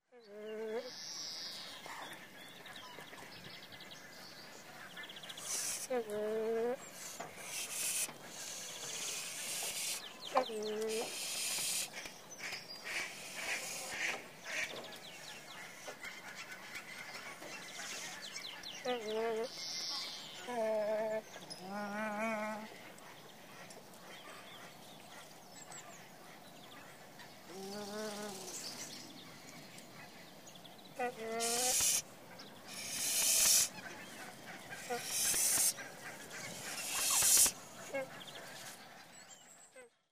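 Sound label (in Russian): природа птицы гавайский гусь издает звуки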